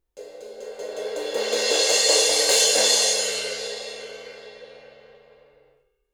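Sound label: suspended cymbal roll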